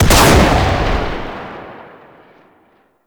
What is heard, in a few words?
Pump Shotgun Shot C; Weapons; Warframe; Zariman